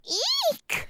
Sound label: eek